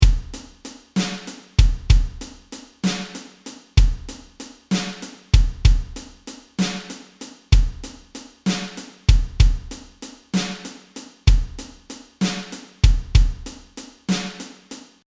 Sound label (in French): Blues batterie